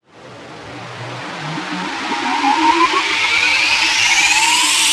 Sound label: Murkiness Riser FX, Dubstep; One-Shots; Effects; Ghosthack; Dubstep Sounds 2025